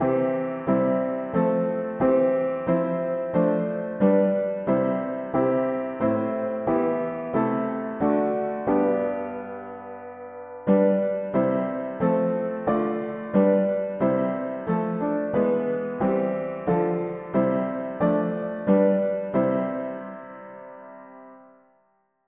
mono piano; hymns; Now may the God of peace and love

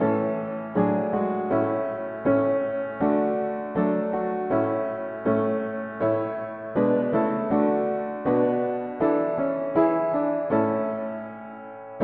mono piano; Jesus Lord Almighty Saviour; hymns

